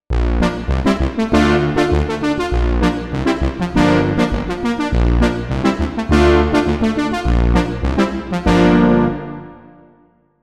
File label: punch brass; pro12